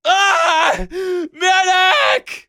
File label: medic hurt; Voices Emotes